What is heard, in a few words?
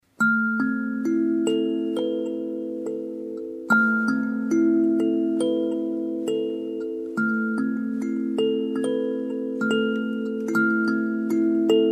sansula